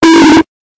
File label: bonk bass boosted; bonk meme